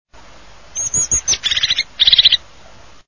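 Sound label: crested tit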